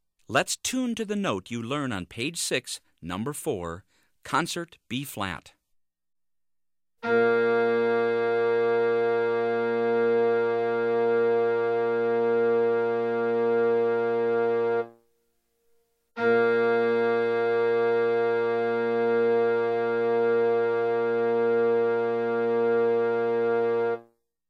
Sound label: Tuning Note- Concert Bb; Standard of Excellence Band Method Book 1